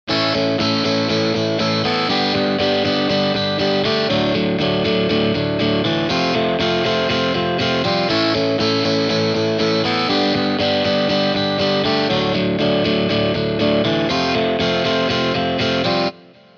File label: College Rock